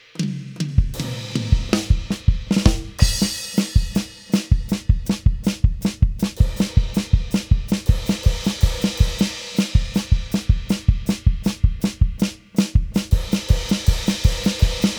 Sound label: Music Transition Perc; GnomeGnight